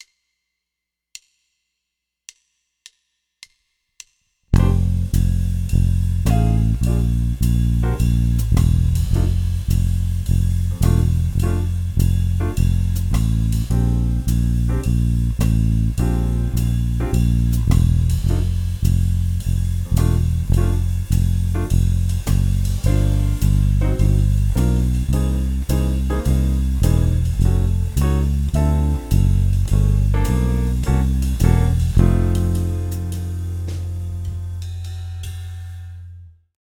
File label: Walking Bass; CU Walking Bass Improvisation For Beginners; Step 2 Playing Example